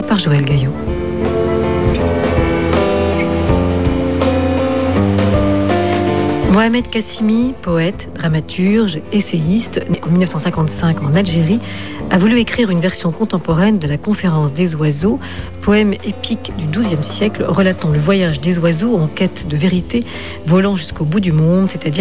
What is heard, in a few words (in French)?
generiques